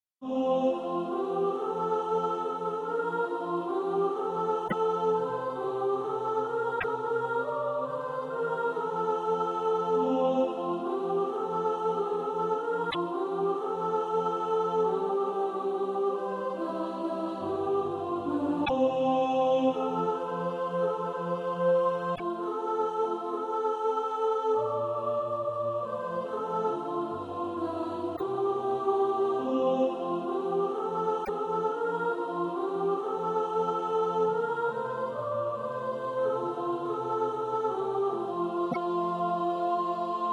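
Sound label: soprano, Practise Music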